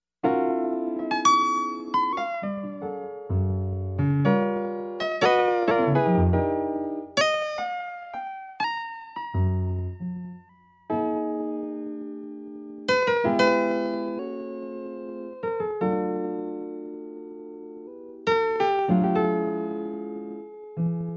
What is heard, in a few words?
piano factory